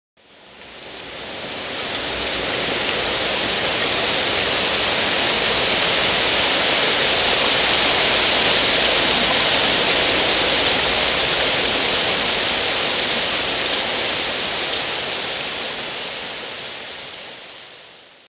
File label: heavy rain